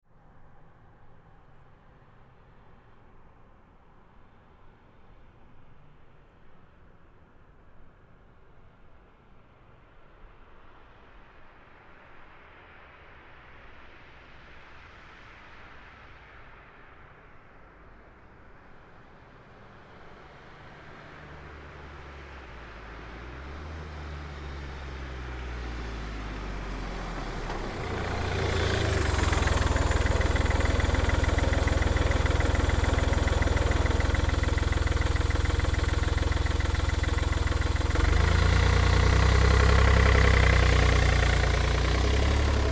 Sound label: Car Smart Car Up Stop Away Slow; 2005 Smartcar Fortwo; Vehicles; BigRoomSound